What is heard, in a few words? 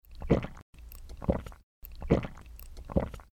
drinking